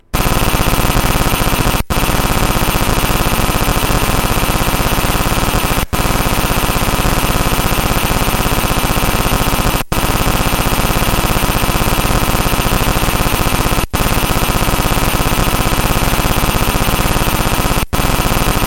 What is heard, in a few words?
rfid portal; vdb 7 emv-tagung